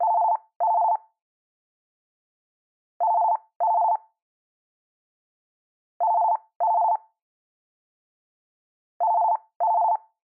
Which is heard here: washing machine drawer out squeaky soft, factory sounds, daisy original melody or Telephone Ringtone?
Telephone Ringtone